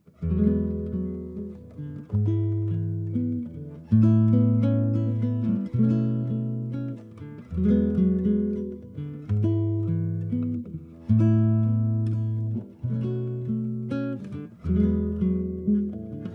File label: ballad gtr dry